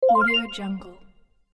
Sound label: bright positive retro video game confirmation menu sound